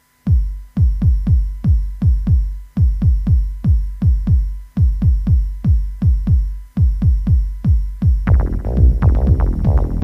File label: space dx21